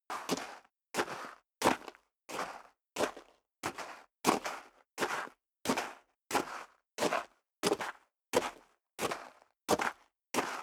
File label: step high heels gravel light walk sequence; schritte; Footsteps; menschen